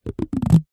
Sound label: rubber glove stretched finger rub creak